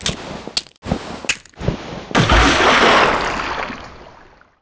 plane para end; overlord

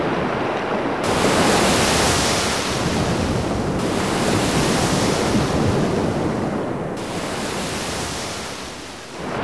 lander waves; overlord

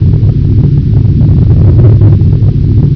rocket rumble

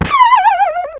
link fall; zelda

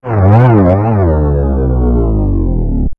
boss death; zelda

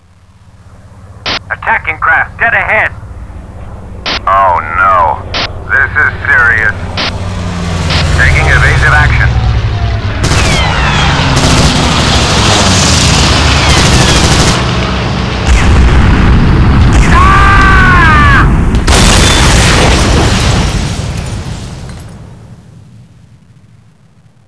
plane end; overlord

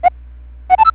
game message short; zelda

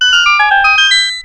dungeon unlock; zelda

